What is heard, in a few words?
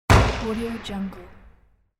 cinematic gunshot